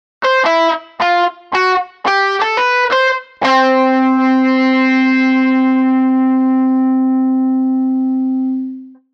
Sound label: Electric Guitar Riff with Feedback; Electric Guitar Riff with Feedback Sound 01